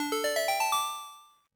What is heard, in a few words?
Cowboy Secret; JotPK